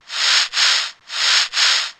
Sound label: P WS CABSA, DRUMS; CMP - Analogon